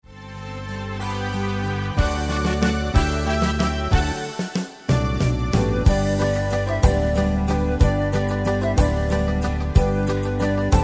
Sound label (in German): Walzer Mix